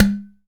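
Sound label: open tone, UDU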